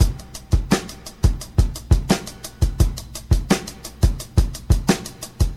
86 bpm vinyl record soul breakbeat sample f sharp key; drum loop